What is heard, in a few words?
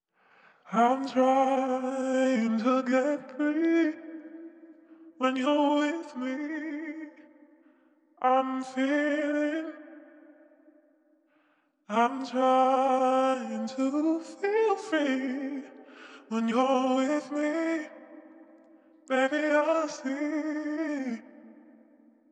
Vocal Phrase - 86 BPM A Min; Cymatics; Infinity Vocal Collection